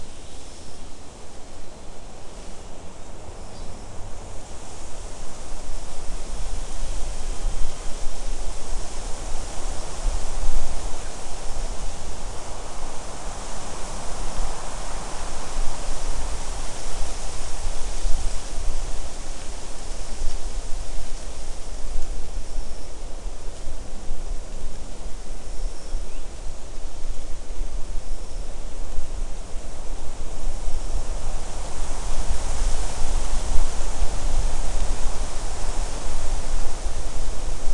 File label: mixture of bird and insect